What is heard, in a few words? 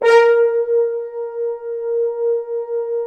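BRS FHRN, French Horns Accent; Roland L-CDX-03 Disk 2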